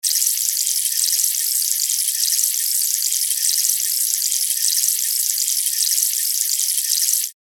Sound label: rainstick dry